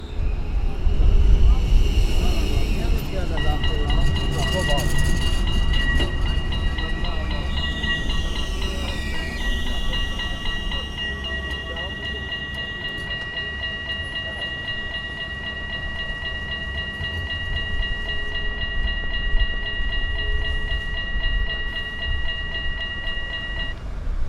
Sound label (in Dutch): Rotterdam Tram Overwegbel Passerende Tram Rotterdam Centraal